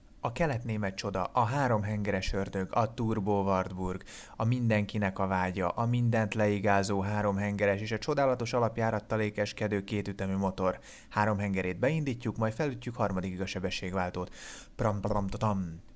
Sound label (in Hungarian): noise wartburg; Wartburg csengőhang; vicces